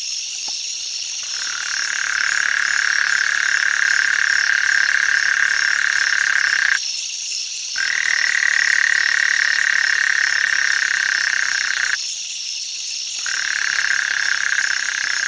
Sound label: Tlalocohyla loquax, hylidae